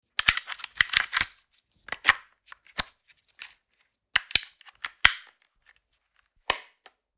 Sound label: Remote TV controller changing battery; appliances